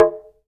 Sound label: TALK DRM, Heart of Africa; Spectrasonics